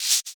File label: Shaker; Equipped Music; Loungin House